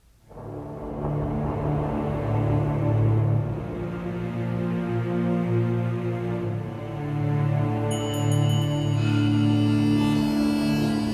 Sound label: Event Menu; Event Menu Nordic Divine Dark